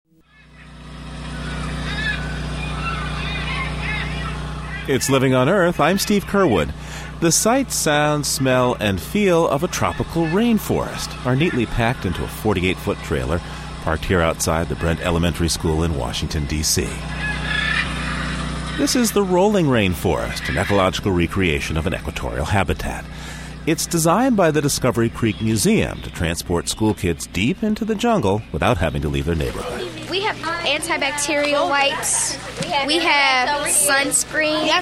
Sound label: rolling rainforest